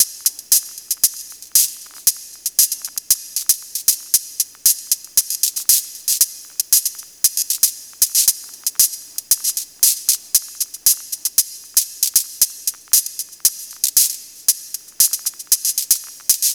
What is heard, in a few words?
loop